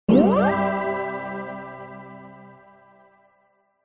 multimedia game tone short positive bonus win glide synth